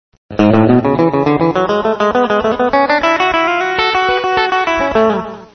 a scale, guitars

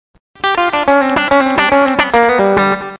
g pull off, guitars

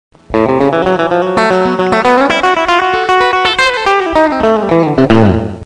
fast fret, guitar

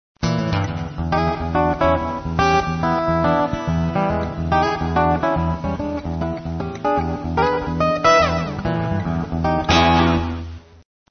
acoustic blues, guitars

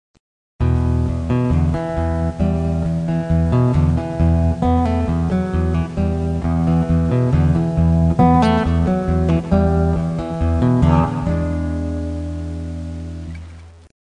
acoustic blues

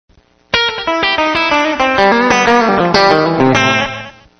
country chop

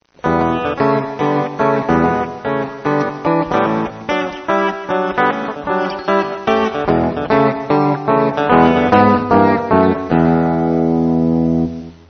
rhythm, guitars

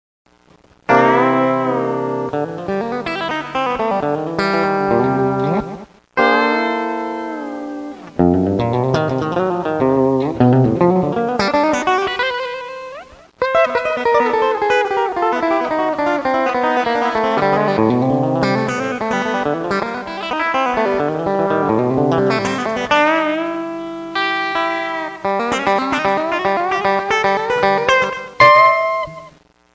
riff, guitars

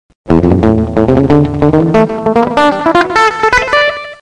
single noted

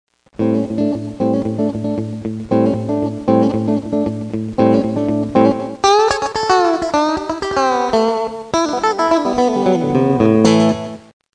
rock rhythm, guitars

